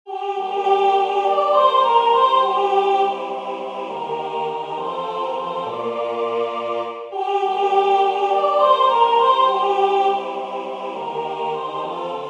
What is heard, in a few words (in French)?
soliste; J ai vu des fleuves d eau vive